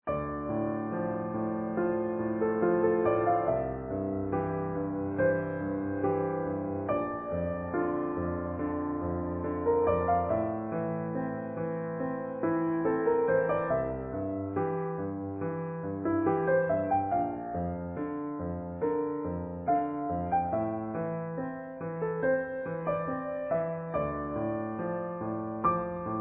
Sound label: Easy Piano